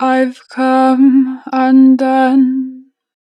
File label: Natural Vibrato, Vocals, 2 bar vib; Undone; Harmor Vocals